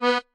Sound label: marimba chainsaw; ゆめ2っき